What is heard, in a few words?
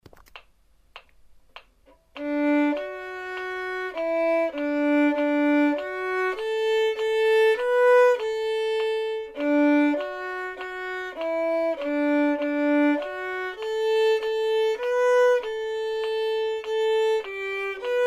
vln prac